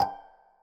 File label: synth